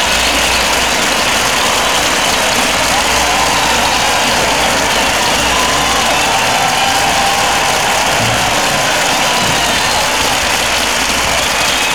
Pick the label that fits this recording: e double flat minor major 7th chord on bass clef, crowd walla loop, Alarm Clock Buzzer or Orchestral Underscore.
crowd walla loop